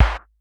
noise kick, Clavia Nord Drum

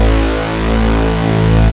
CHORD, DRONES & PADS

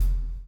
CHAIN; Spectrasonics - Backbeat

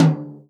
tom, Real Drum Kit, jungle kit

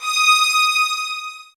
D, soft violins

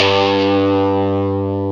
GTR Tube, Dirty; Club-50 - Foundations Roland

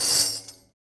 Tamorra Ending Loop Release, Percussion

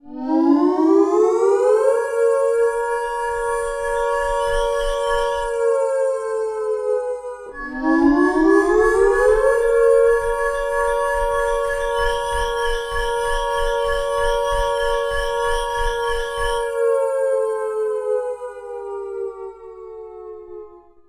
tech fx; Best Service; Hyper Dance; EFFECTS

